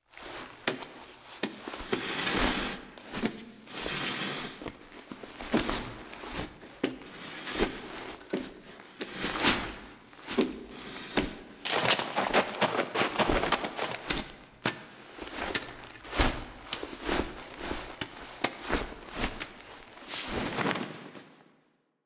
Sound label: Crawl Foley; Dax; Warframe; The New War; Lua War Room